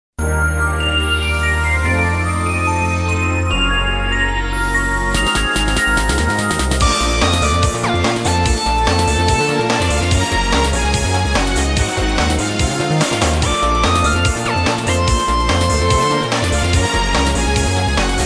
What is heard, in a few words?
bgmusic; tails